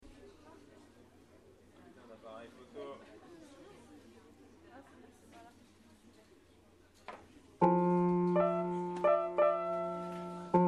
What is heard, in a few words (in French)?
impro clavier bizarre, concert